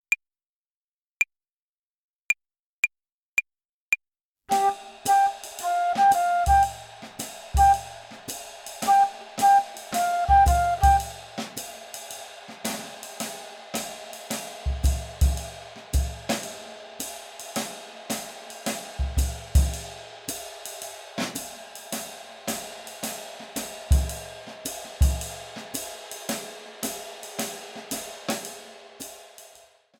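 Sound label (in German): Swing Phrasierung Übung; play along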